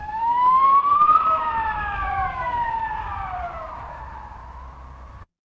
mechanical siren

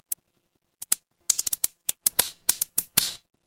fire electric crackle

